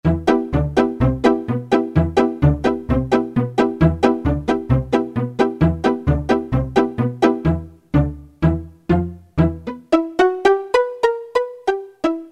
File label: Pizzicato, Roland D-110; Factory Patches